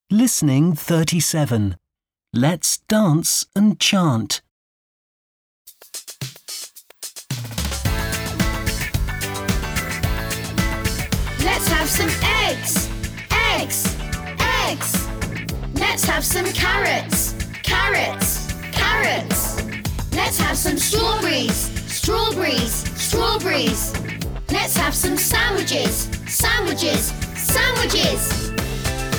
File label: chant